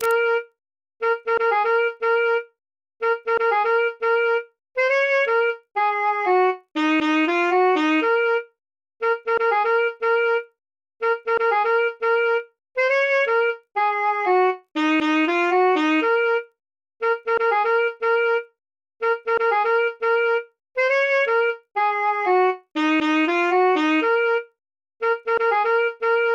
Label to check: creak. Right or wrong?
Wrong